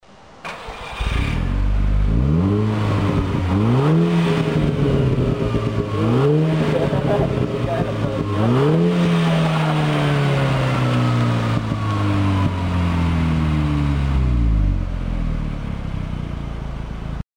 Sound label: Audi TT 8J 2l